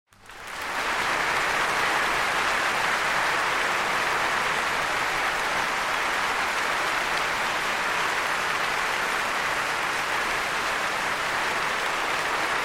Applause Crowd Long

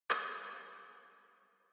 Clock Minute Hand Reverb

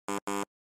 Quiz Buzzer Multi; クイズ ブザー; マルチ

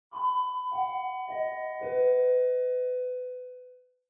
Chime Announce

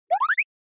Anime Motion Long; アニメモーション

